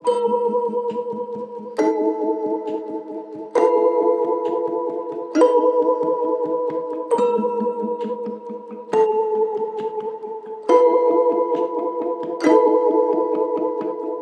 Kalimba Chords; Kount's Home Stretch Sample Pack

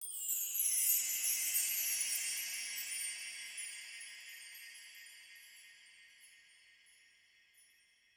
Mystic Chimes; Vignette Kit

